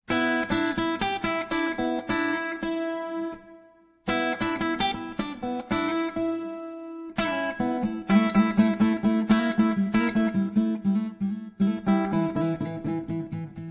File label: blues